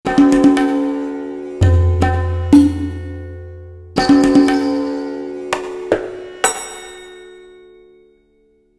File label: bath